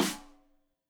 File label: Rim Shot Stereo; Deeply Sampled Drums; SMDrum